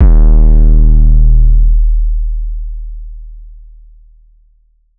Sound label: 808 one shot Eb; Slate Digital BASSHOLE Sample Pack